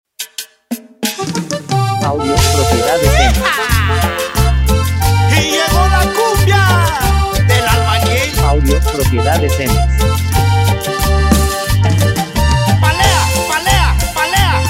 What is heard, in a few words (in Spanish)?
cumbia surena